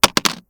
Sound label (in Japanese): もの落とす